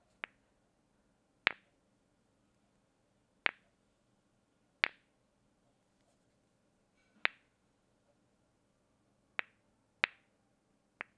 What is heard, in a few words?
Stone Tap